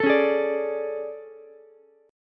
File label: A Major 11th, down Ukulele C6; AM11; strummed; JGuitar